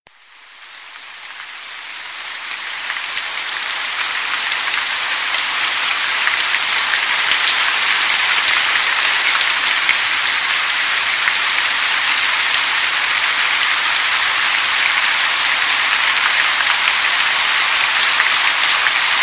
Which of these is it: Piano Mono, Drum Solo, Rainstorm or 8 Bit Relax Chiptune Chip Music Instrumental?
Rainstorm